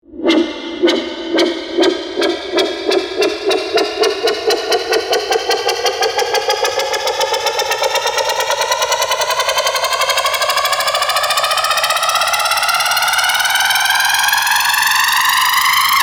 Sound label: space helicopter